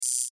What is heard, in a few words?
ELECTRONIC DEVICE; Electrical Button; Multimedia; Alarm Buzz Electronic Device Loop; Electrical Buttons; Blastwave